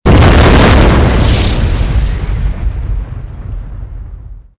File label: sam boom, weapons; Soldier Of Fortune II Double Helix; XBOX